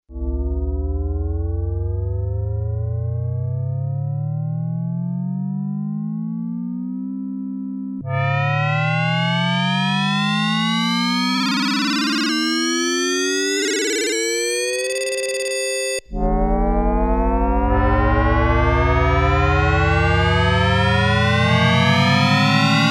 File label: GLIDE UP-DOWN SE, Korg DW-8000; Factory Patches